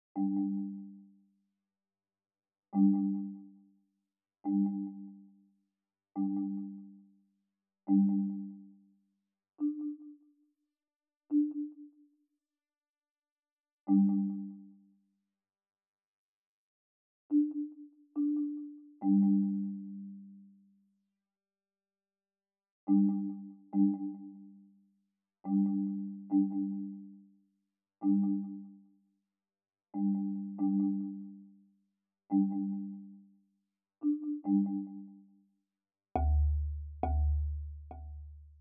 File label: marimba arco